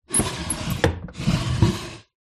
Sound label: household antique larder drawer open close